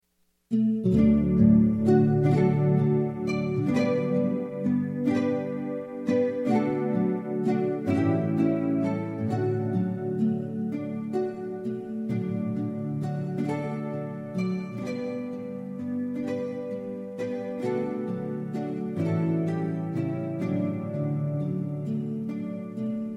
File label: guitar